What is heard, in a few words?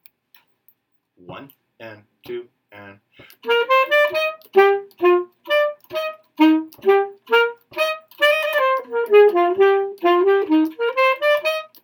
Alto Sax - Allegro; Solo Accompaniments; Leclair part 1; Leclair-Saraband and Allegro